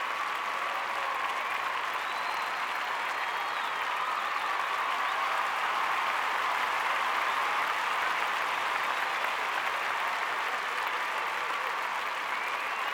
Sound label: lobby crowd cheering; smite; gilgamesh